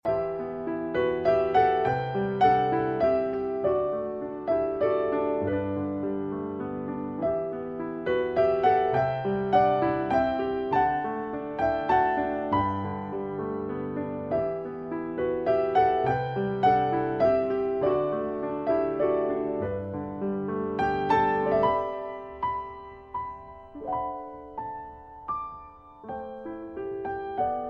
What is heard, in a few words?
romantic tone